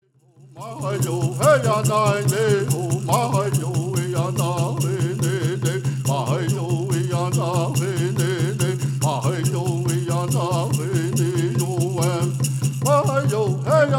Peyote Song

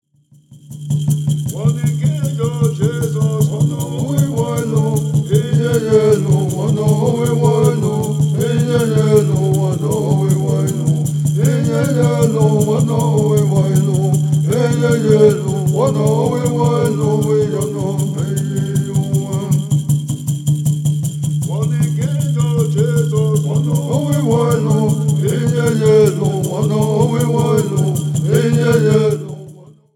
Native American Church Song

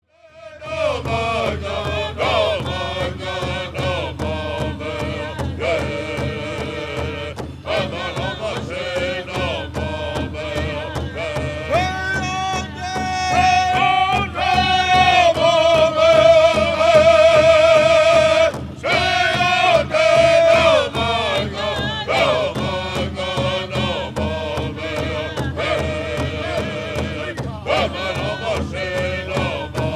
Old Ponca War Dance Song

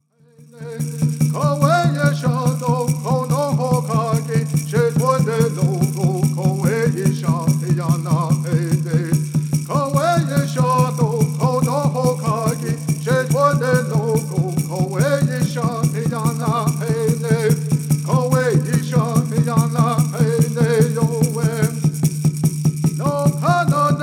Native American Church Song

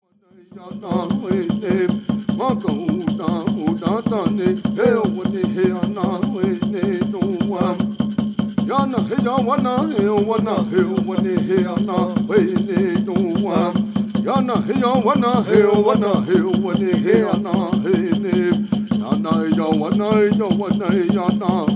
Ceremonial Song

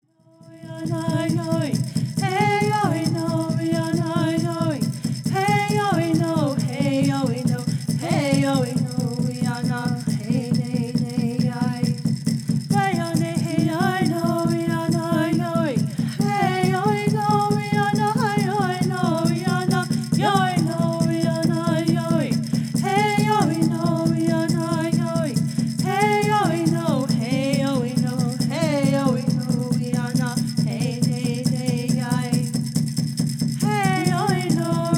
Straight Song

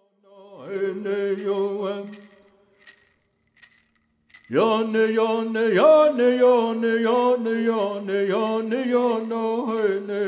Apache Peyote Song

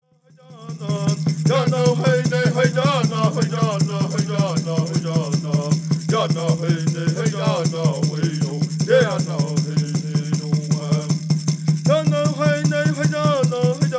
Peyote Song

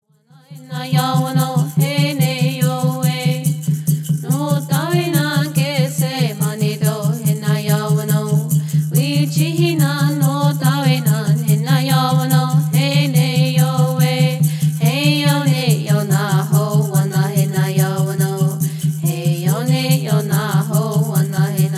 Peyote Song

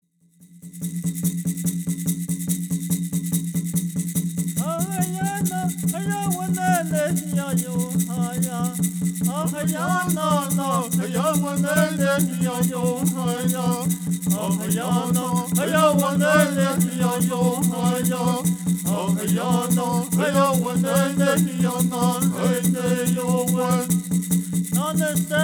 Peyote Song

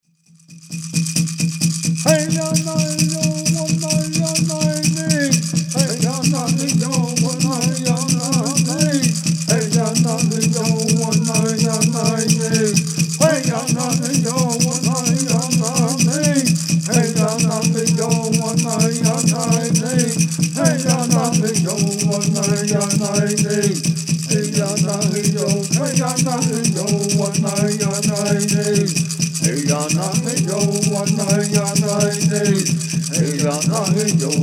Straight Song

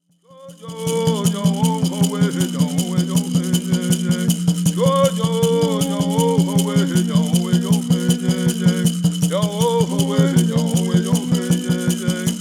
Native American Church Song

